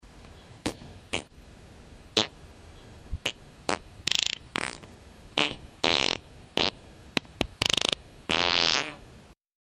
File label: a fart; quirky